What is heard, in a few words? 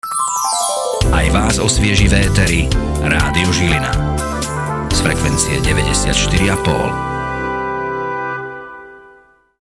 jingle